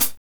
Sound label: HAT, Drumscapes Roland